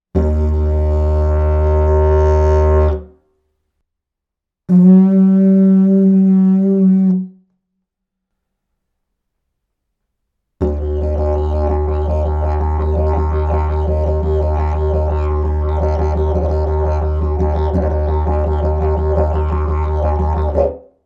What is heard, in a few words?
D, didgeridoo proline eukalyptus d